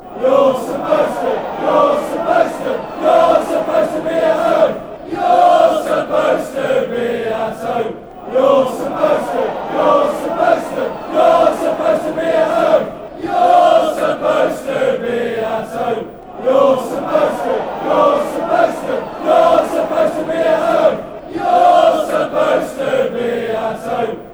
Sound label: youre supposed be home fanchants; dcfc